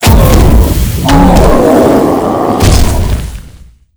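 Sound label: boss death, monster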